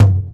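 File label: dundun; ILIO - Ethno Techno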